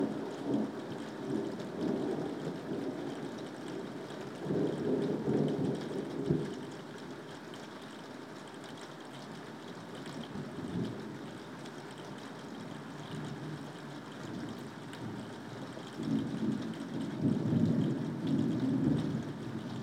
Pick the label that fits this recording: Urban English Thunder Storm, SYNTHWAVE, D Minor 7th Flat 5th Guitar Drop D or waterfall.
Urban English Thunder Storm